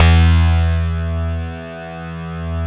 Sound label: PERC BAS; Synclavier Sampler Library; KEYS AND GUITARS